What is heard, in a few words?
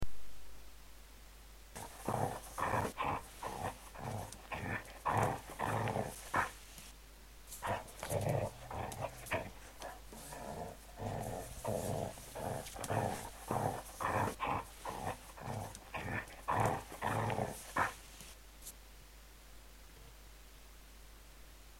playful growl